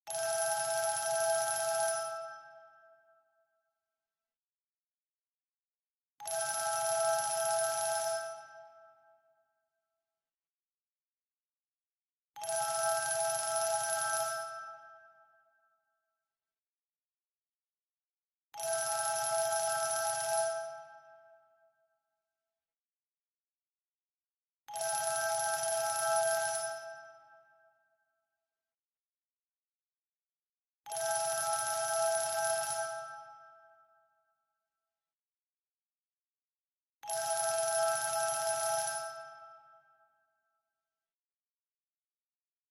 Telephone egyptien

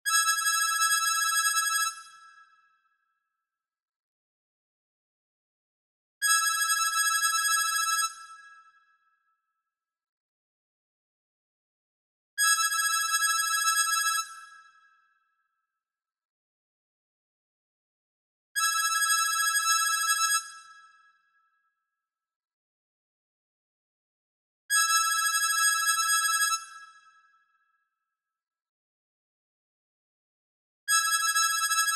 Telephone italien

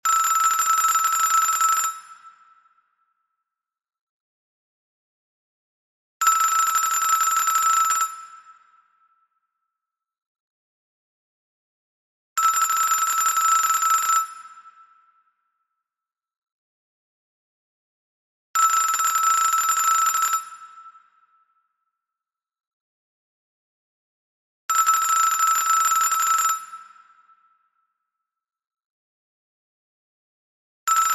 Telephone islandais, telephones

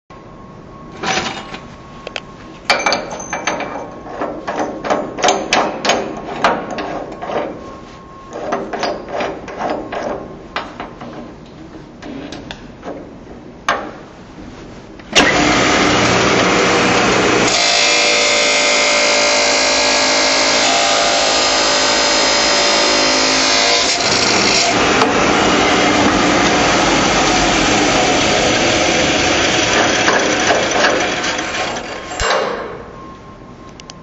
Scie circulaire

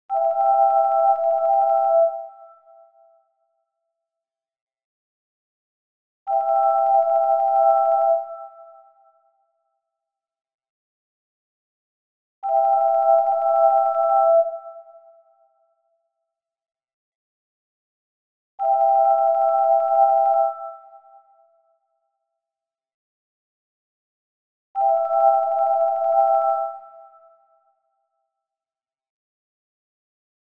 Telephone suedois

